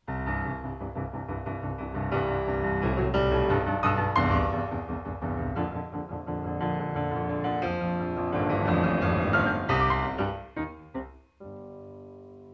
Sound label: piano puzzler